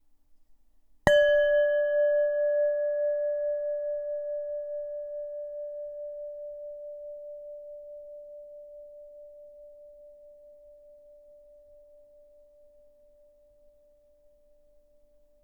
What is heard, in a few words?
singing bowl